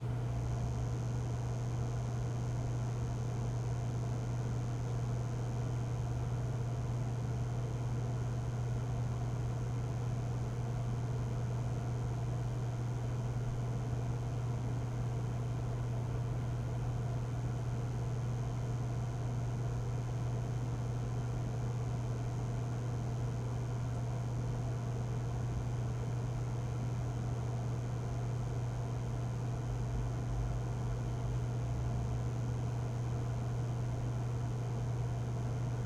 INT Torture Chamber, Roomtone, Lights, Hum, Air; 344 Audio; Haunting Ambiences

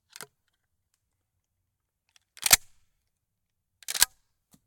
Shell Load Round Eject, 12 Gauge Shotgun; TS Sound

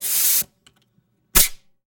Compressed Air Hiss and Spurt; Fascinated Sound; Scene Shop Tool Box

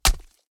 Stab Punch Hit, Gore; SoundBits; Just Gore Add On

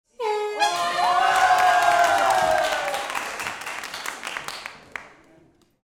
Audience applauding; Laughs

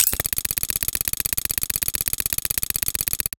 tiny gears small mechanism sequence; BluezoneCorp

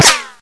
ric, weapons; hl2

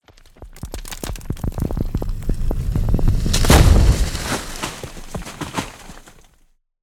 texture wood transition impact; Scary Sound Effects And Ambiences; Bluezone; Nightfall

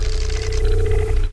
power up, roller; hl2

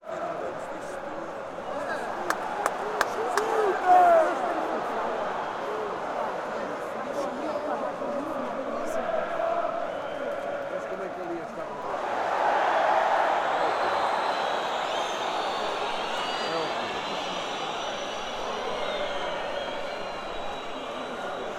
Crowd Booing, Soccer Stadium Ambience; Sonic Bat

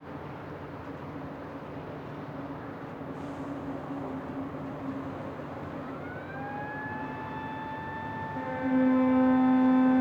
Ship launching shipyard, Adriatic sea; Ivo Vicic; Northern Mediterranean soundscapes

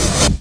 release, extinguisher; hl2

